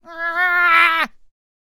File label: Death Vocals; Shouter